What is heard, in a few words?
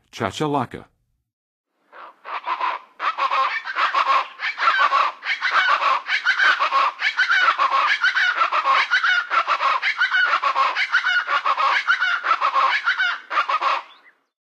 Plain Chachalaca, Birds of North America; Western USA; Western USA Songbirds